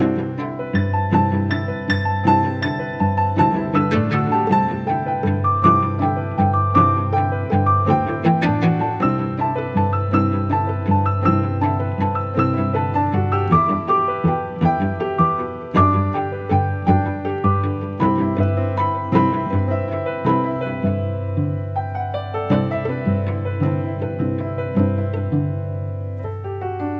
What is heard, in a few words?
Music Level Bubbles Layer Mix